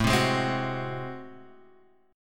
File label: A Minor Major 13th, down, Guitar, Open G